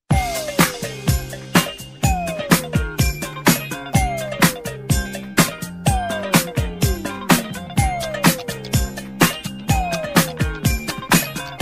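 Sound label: instrumental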